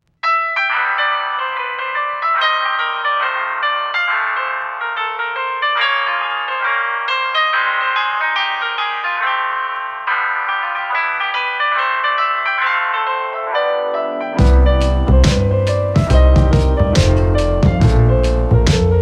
lo-fi hip hop; a stroll in paris

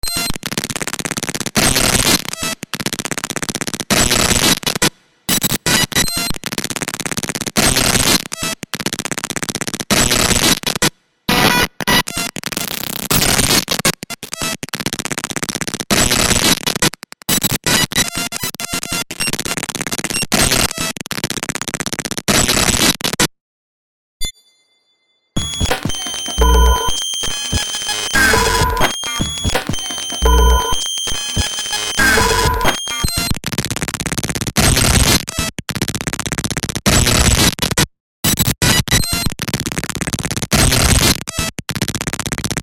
noise jungle mod corruption

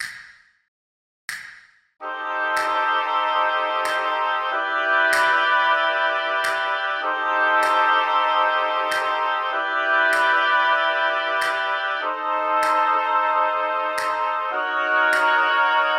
oboes!

